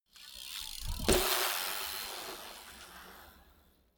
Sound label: bmx maneuver ramp land